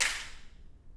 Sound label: hall impulse; 講堂4; プロジェクトA